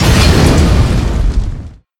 sword transform explosion

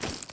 weapon draw; ins2; weapons

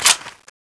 clip out; tfa cso; monkey wp set1

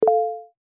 alert

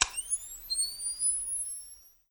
tiny cap charge; snds jack gmod

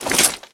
fidget move

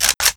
pull; weapons; mystifier

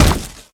step run

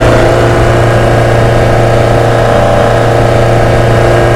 rev down, alfa romeo

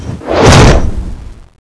charge slash; cso; runebreaker

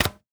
flare gun load; tools

